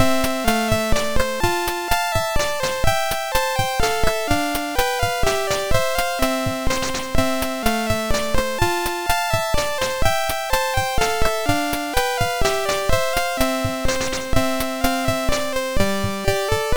Basic Gametune sid MOS6581R3

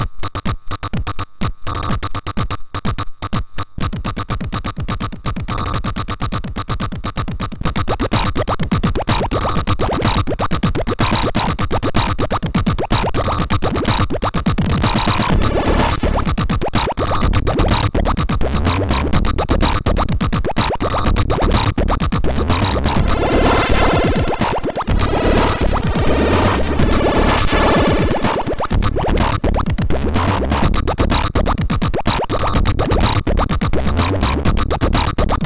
Acid Dance, MOS6581R2

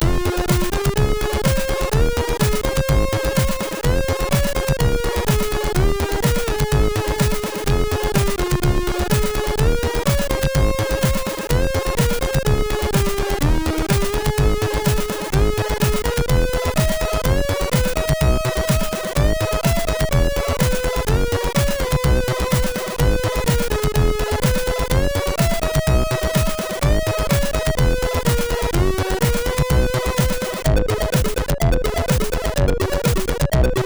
No Limits tune, MOS6581R3; sid

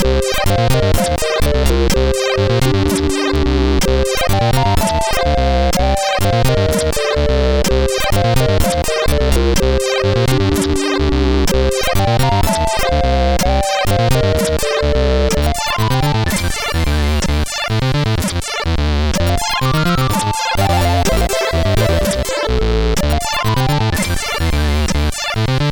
sid CSG8580R5; Centurion; hvsc

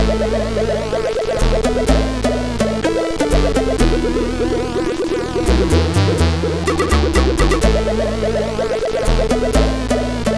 Short Loop, CSG8580R5

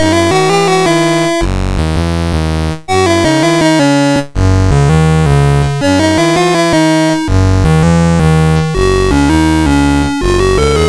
MOS6581R3, GAMES; Scarab

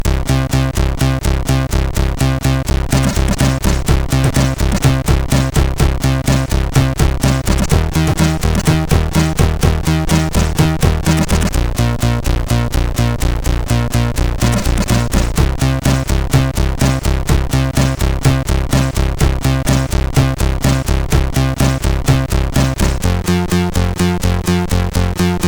Splash Crash, MOS6581R3; sid

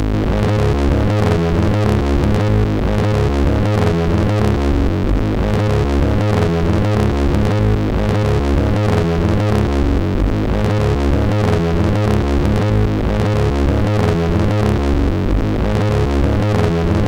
Big Echo, sid MOS6581R4

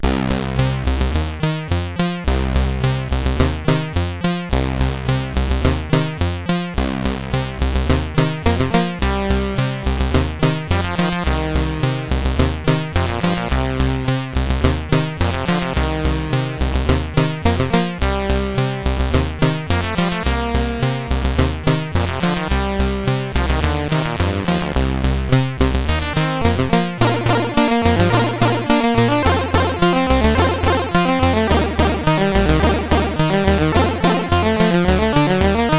tape version CSG8580R5; sid

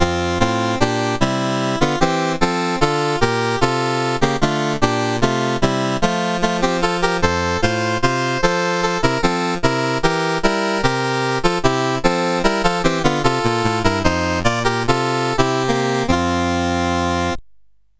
menus, sid CSG8580R5; Games Summer Edition

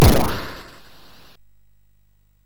SFX, MOS6581; Narco Police

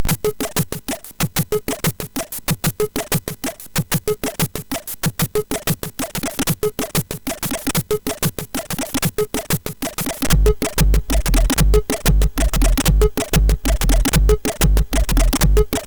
Jungle Beat, sid, MOS6581R4

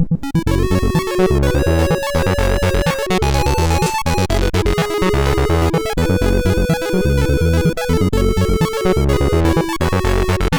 25Hz sid MOS6581R4

